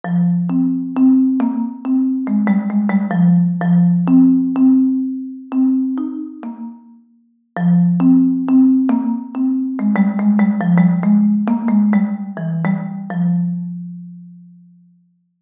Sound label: Marimba